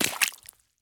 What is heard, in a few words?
run puddle; Escape from Tarkov